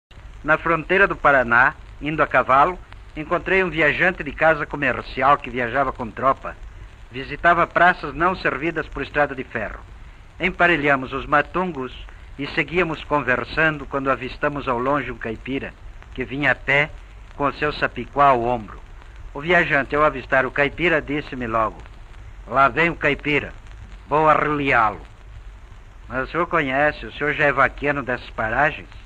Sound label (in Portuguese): Trecho 2 Rebatidas de caipiras; São Paulo dos anos 30